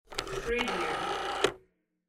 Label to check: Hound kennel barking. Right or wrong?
Wrong